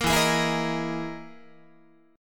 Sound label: E Major 7th Flat 5th down Guitar; EM7b5 strummed